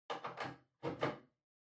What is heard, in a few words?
TV Hardware Far HDMI Plug In